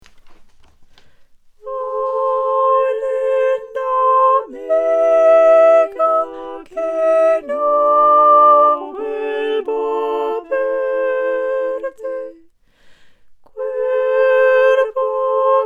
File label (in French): Tenor; Ay Linda Amiga